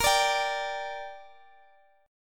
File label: A sharp 6th A sharp down Guitar Open D